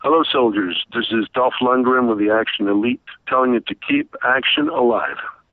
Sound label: shout